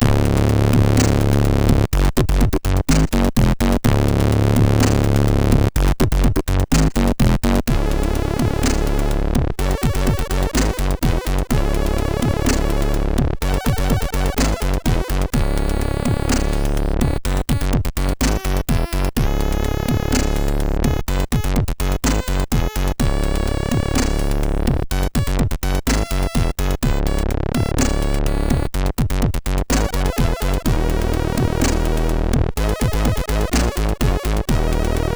MOS6581R3, sid

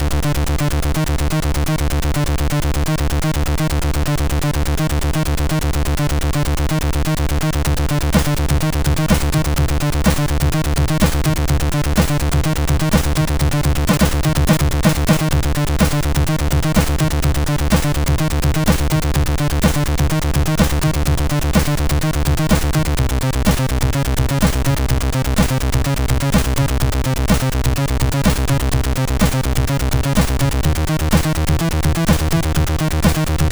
Digi Acid, MOS6581R4

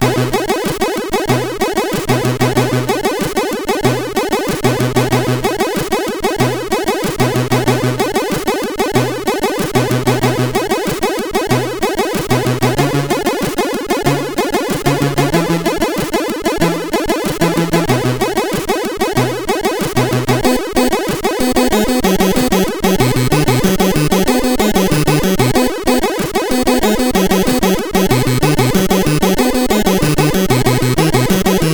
Relaxation,sid CSG8580R5

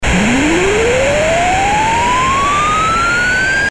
MOS6581R4, GAMES; Tron BASIC; HVSC

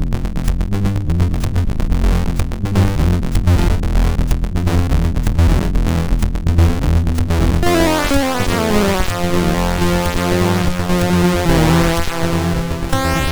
Minimal, sid MOS6581R4

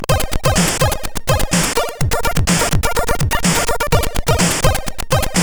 tune, CSG8580R5; sid

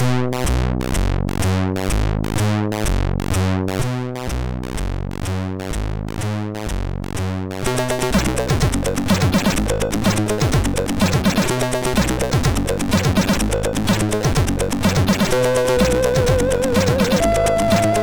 Flute sid MOS6581R2

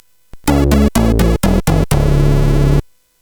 Game Music, CSG8580R5; sid

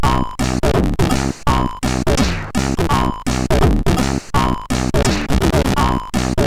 Monster Kick, MOS6581R4

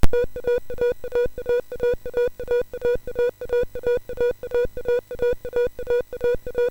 SFX, MOS6581; Narco Police; hvsc

